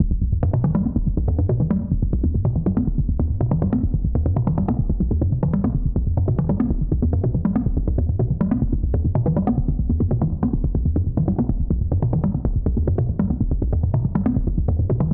Arp Signals Modular Em 141bpm Loop; SIMMS

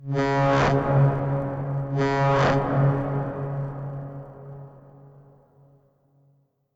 Digital Call C; Baltic

